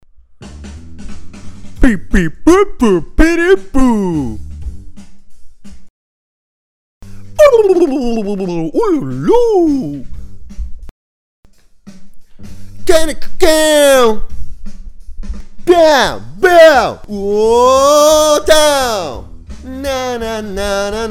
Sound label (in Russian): Рок-н-ролл вокал импровизация